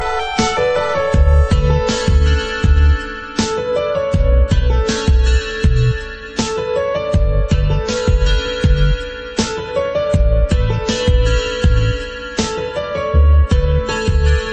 piano; alternative

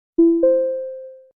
Two Note Chime

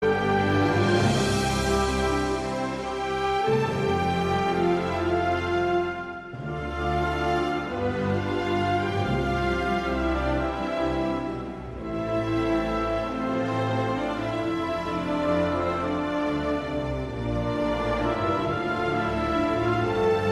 free ringtone

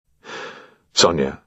Ahhh

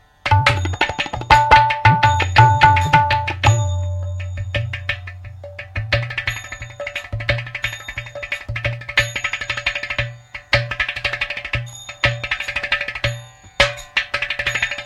tabla

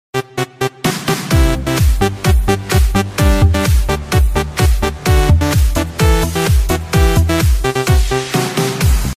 party shaker

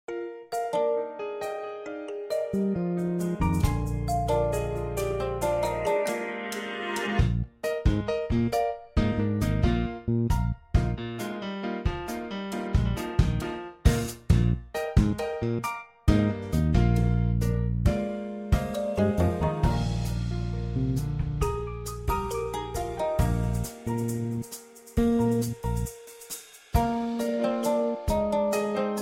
tone

